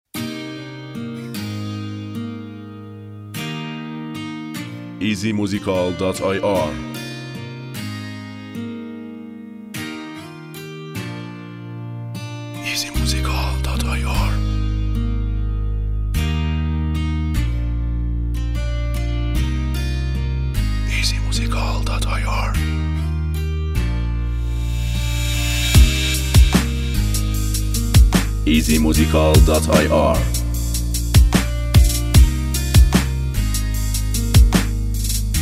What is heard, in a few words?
Beat